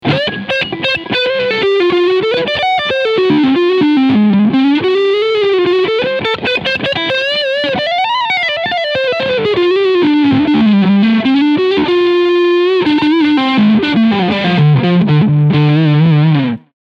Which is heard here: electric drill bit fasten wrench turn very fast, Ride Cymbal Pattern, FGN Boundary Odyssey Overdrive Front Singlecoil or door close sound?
FGN Boundary Odyssey Overdrive Front Singlecoil